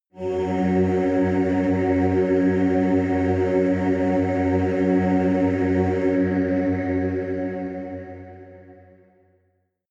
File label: demon choir one note low